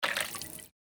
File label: step water, footsteps